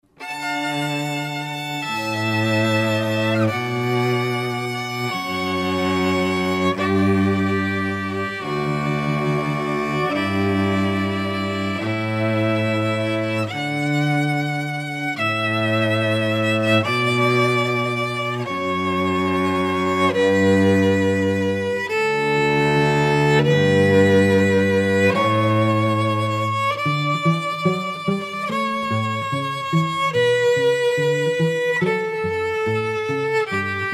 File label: Vln Cello; Canon in D (Pachelbel)